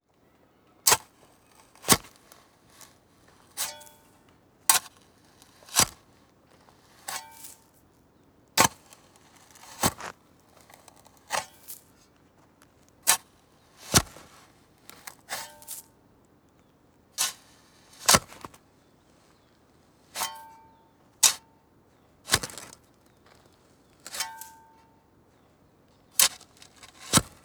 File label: Digging; TheWorkRoom Audio Post